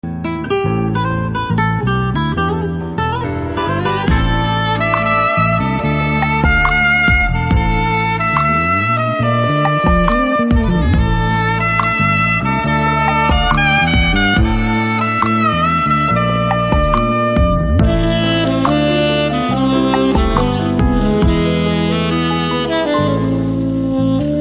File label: Instrumental BGM Ringtone; Rang De